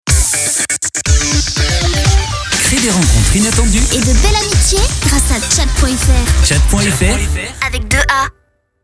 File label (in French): jingle; chaat